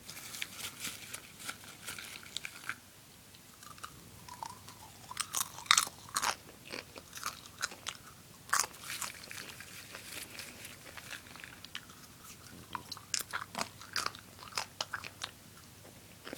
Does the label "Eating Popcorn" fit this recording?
Yes